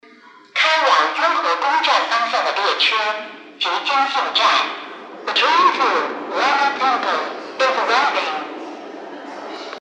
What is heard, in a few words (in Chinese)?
2号线-雍和宫方向进站